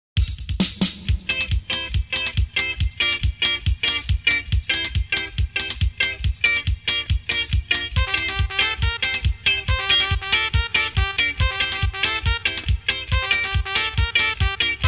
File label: Ska High; Soundzabound Volume 1 Disc 2